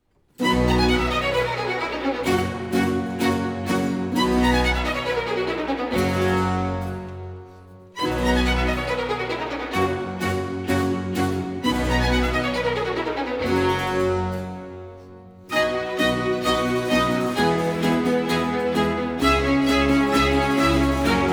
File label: Violin concerto in C major, Larghetto; Vivaldi; I Concerti dell'Addio; Fabio Biondi; Europa Galante